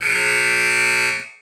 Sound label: Hockey Buzzer; Hockey Horns & Buzzers